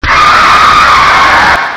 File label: alert; ragemap2023; slasher; slasherghost